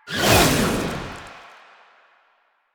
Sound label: Power Slide Start, Motorcycle; Motorcycle Ninety Nine; Bullet Jump